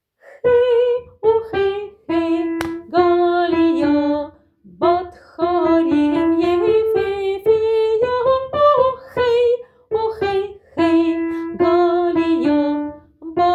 Simi jadech Soprano

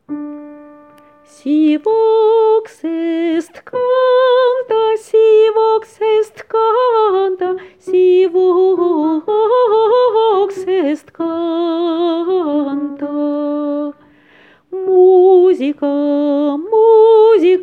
Si vox est canta Soprano

